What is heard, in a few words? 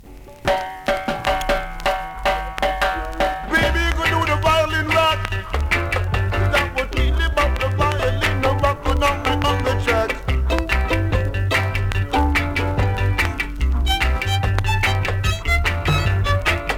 VIOLIN